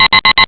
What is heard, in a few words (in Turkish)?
echolink bip sesleri, beep; TB2AJ